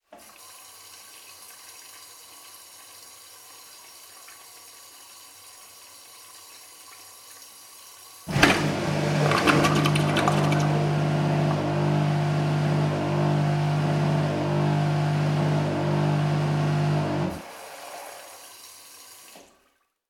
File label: household toilet flush macerator; sound effects one